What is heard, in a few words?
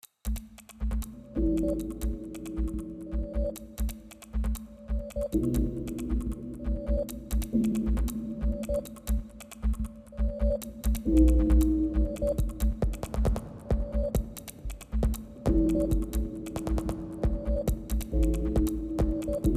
Swinging blades